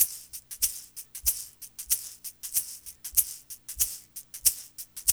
SHAKER, Funky Ass Loops; East West

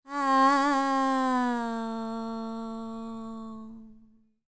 World Voices, INDIA; ILIO; Vocal Planet

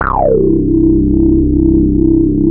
Anasound Long C, Industrial Analog Single Notes; Sonic Foundry (Sony Creative Software)

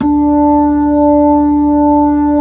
B-3, Brite; Keyboards of The 60's and 70's

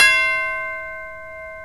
DULCIMR KOTO; OMI - Universe of Sounds

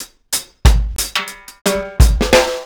RG90 DRUM, REGGAE; Best Service Advanced Media Trax Modern Composer

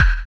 SONAR L; Spectrasonics; Metamorphosis

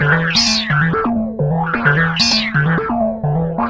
Analoop, Industrial Analog

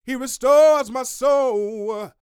Vocal Gospel; Vocal Planet Vol1 Gospel

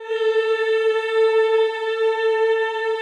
CHO A3, Aaahh Yhh; Sound & Vision - Gigapack I CD 2 (Roland)

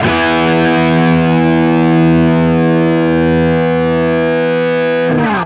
5TH E, Guitar; East West; Steve Stevens Guitar Samples Collection

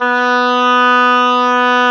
OBOE, Orchestral Winds; mf f; Roland LCDP04; Oboe 2&3

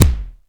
bass, AKAI XE-8; Greytsounds

